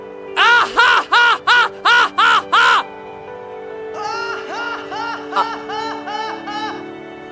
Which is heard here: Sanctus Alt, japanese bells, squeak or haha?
haha